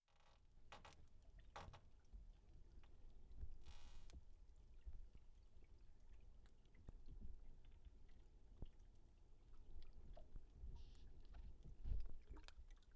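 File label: Hydrophone, Underwater, Harbour; underground sounds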